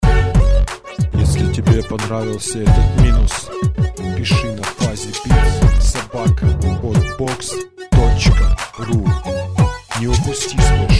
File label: bounce